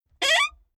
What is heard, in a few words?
Old Landrover Adjusting Rear View Mirror Squeaks Cartoon; Transport Agriculture; Truck Sound Effects